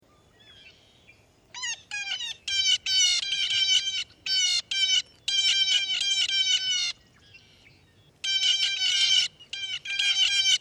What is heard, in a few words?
BLJA graded calls; bird language; What the Robin Knows: Bird Language Audio Library